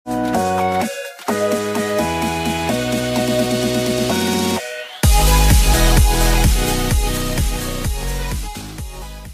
Nhạc intro 5s

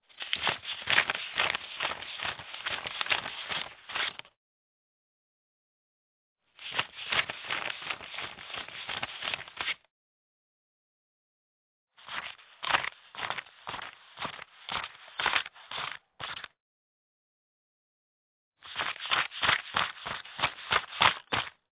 dung tay dem tien

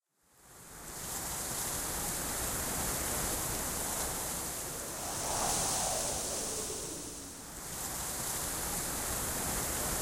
gio thoi la cay ri rao

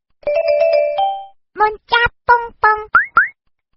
am thanh thong bao de thuong; line

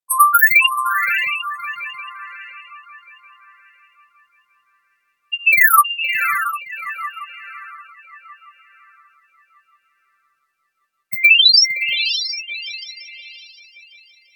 Am thanh phep thuat sang bong lap lanh

